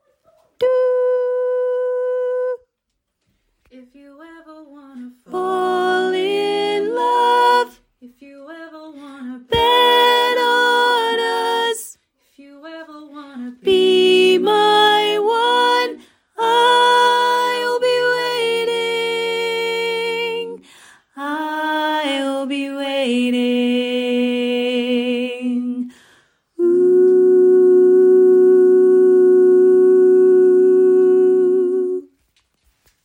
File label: Tenor